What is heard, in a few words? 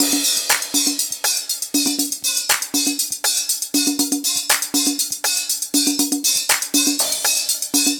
DRM, LOOPS 120, House; Best Service ProSamples vol.10 - House; AKAI